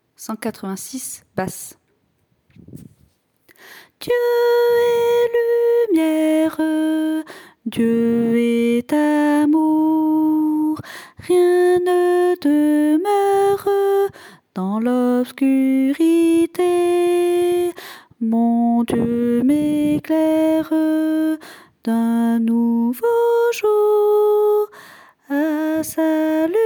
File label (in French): basse